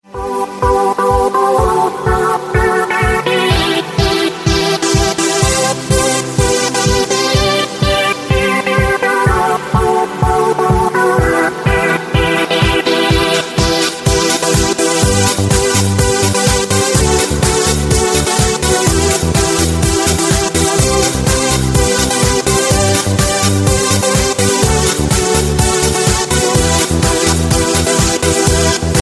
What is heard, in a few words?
club house mix ringtone